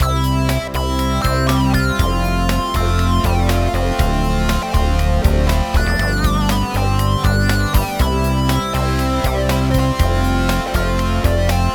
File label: memory mini obxa